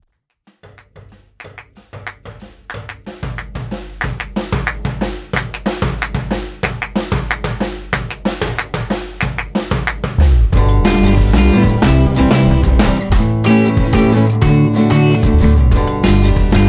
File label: boogie and blues